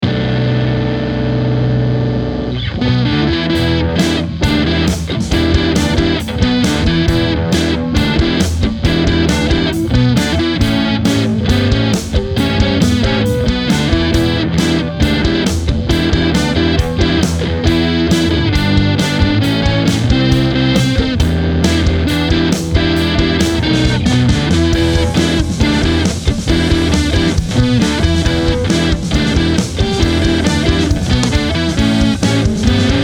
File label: blues